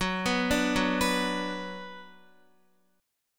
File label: Gb Suspended 4th Sharp 5th Gb, down, Guitar; arpeggiated; JGuitar